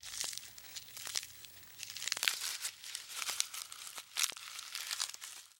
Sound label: giraffe chewing leaves